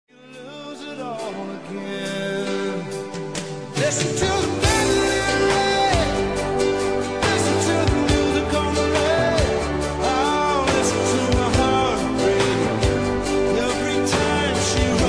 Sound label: mandolin